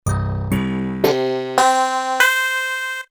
d50 Tine Wave; MUSIC TRACKER

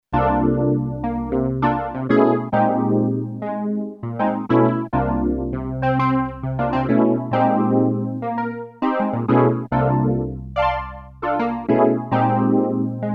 piano, Kawai sx240

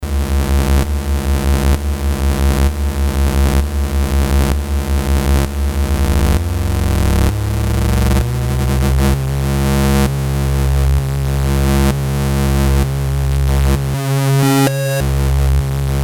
drone, Novation Bass Station II; kinda drone; analog monophonic machine with subtractive synthesis

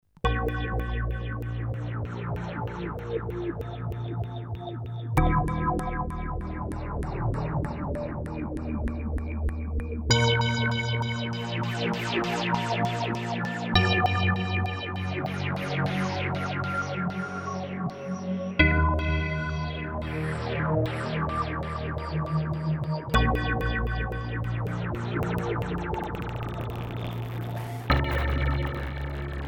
synth; electrix filter factory

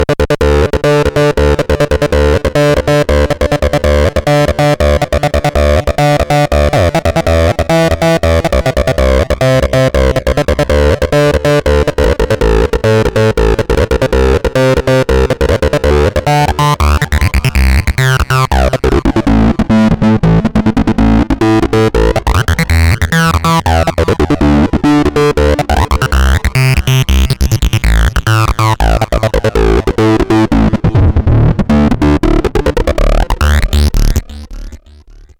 synth dist tb, access virus

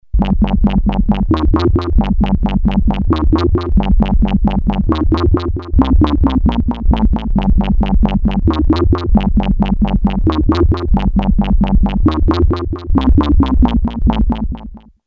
synth mod, Polivoks; Polivoks formanta

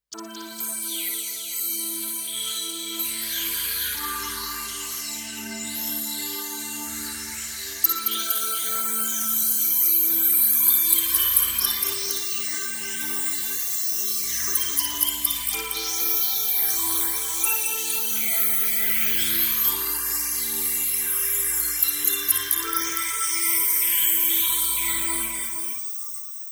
pad hpf, synth roland jd800; PAD HPFME

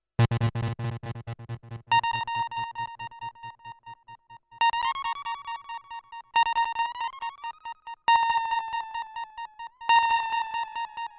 delay, Korg DSS-1; Some parameters can be edited for each delay: feedback amount, modulation intensity, delay time + level; main core based on 8 bits CPU Intel 8085 manages 8 voices polyphony; stereo

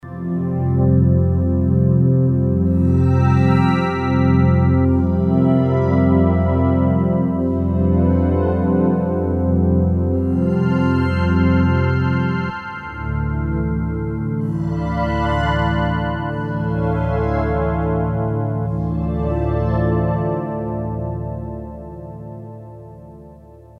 pad, roland mt-32; worrypad

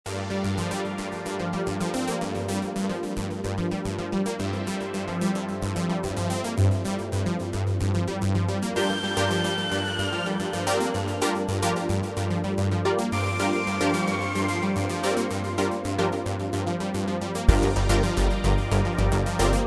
minimax song; Creamware minimax

